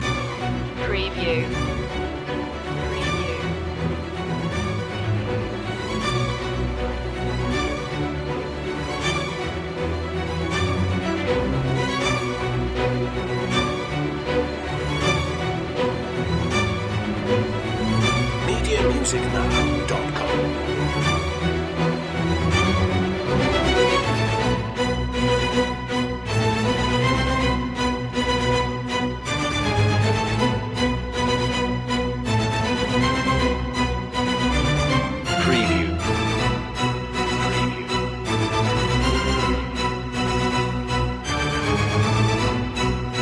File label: strings only